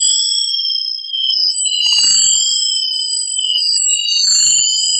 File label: Signal, Textures